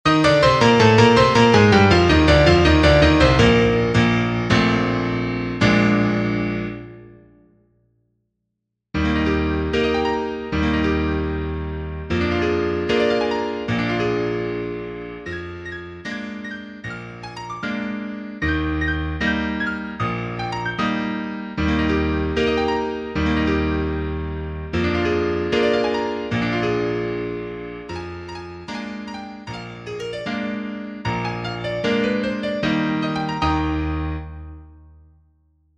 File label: piano, sheet music